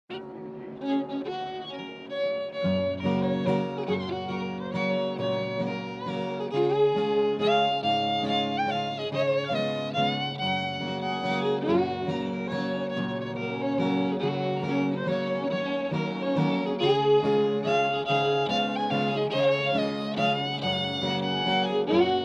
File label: waltz in g